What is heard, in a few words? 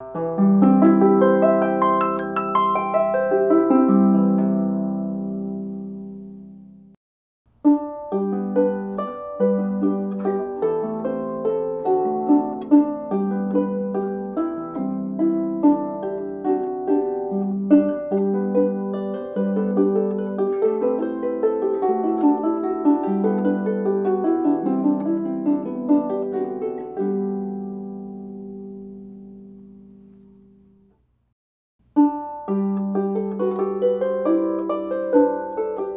Harp 26 String